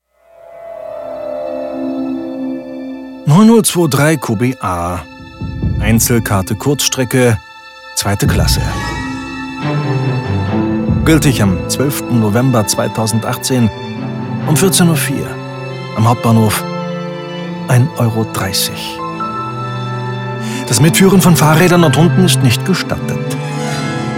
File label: Funk; Audible; Fahrkarte